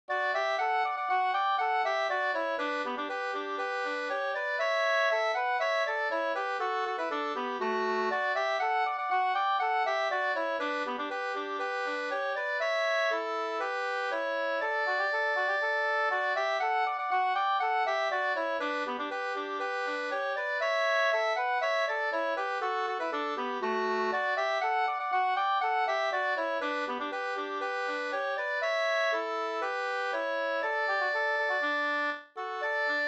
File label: polka